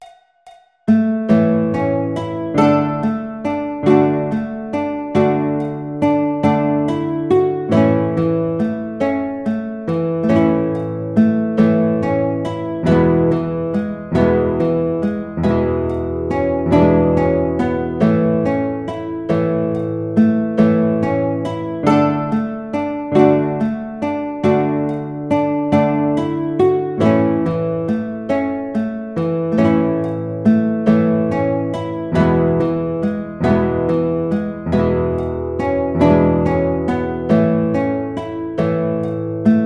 Guitar

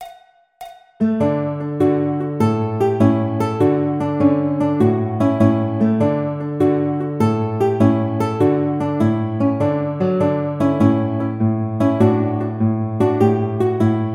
Rondeau Poco Allegretto Guitar, classical; Carulli Op 241 No 07

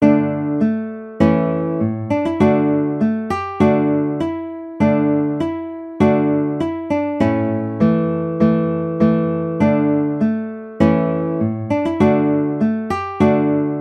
Trumpet Voluntary Guitar

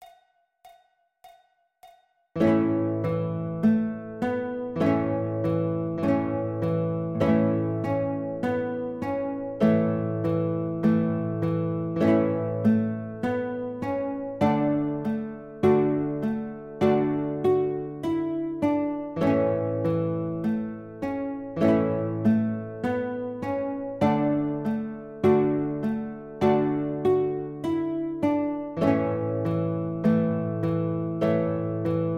Guitar

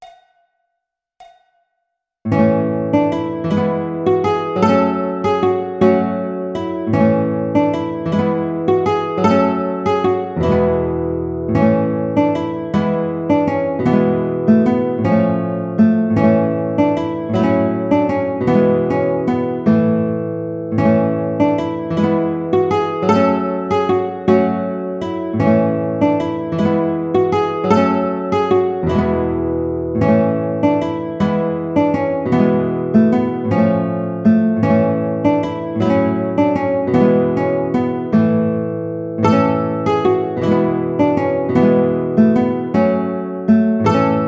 El Noi De La Mare Guitar

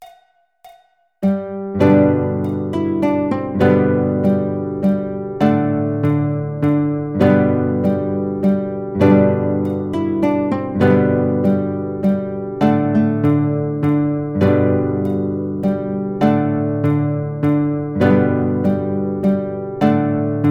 The More We Get Together Guitar

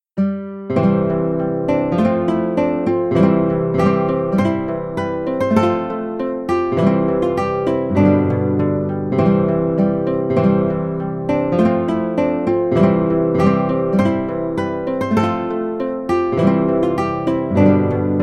Minstrel Boy Guitar

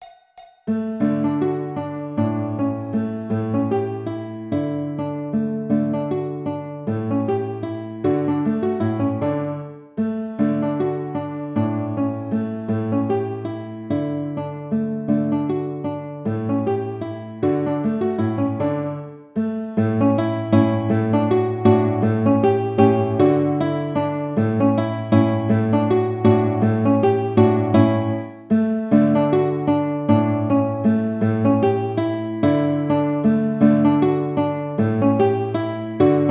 Waltz Guitar; Carulli Op 241 No 08; classical

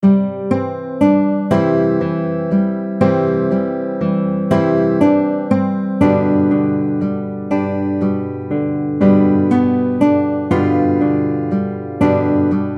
Down In The Valley Guitar

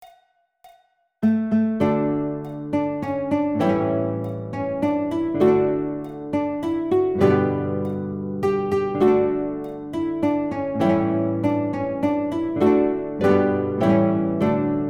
Las Mananitas Guitar

